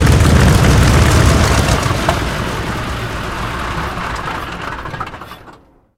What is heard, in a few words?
engine end, engines; War Thunder